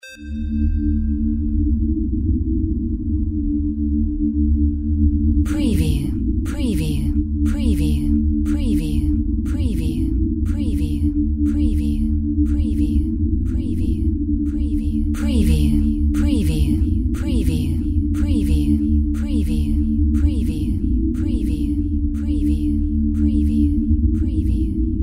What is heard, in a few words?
DRONE LFE VENT GHOST